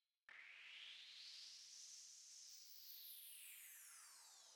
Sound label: Transition Riser